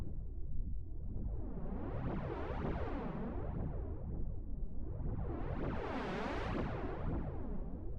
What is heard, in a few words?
Trance